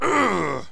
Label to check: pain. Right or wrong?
Right